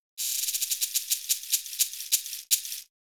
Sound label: shaker FX